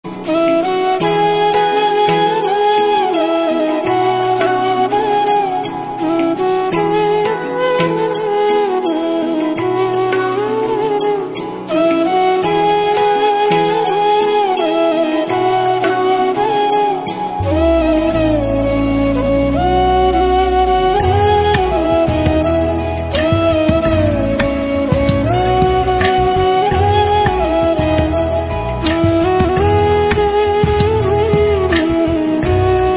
achyutam keshavam ringtone flute ringtone heart toching ringtone